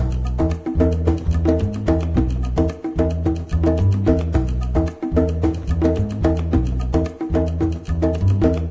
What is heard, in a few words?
Japanese Music; Diner Dash 2 Restaurant Rescue